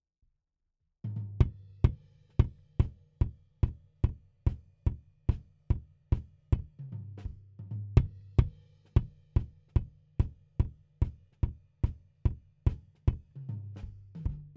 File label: Kick In